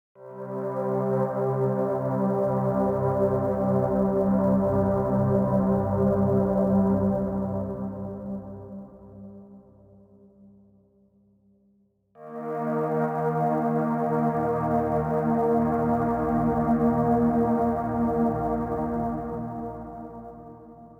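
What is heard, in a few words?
Day Ambience Stinger; dying light gamerip 2015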